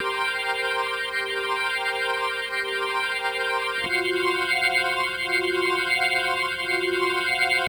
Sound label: 125 Hammond Chords C; Keys; PowerFX - Nu Jazz House